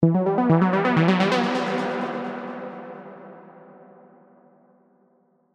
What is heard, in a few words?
Synth Fills E (128 BPM)